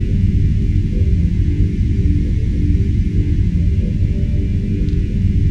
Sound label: CHOIR CELLO